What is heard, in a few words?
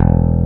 W BASS E1; AKAI S6000 CD-ROM - Ultimate